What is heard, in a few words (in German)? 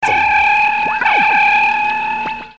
Geräusch, Schiebetür; Werkstatt; Schiebetür (1,20m breit)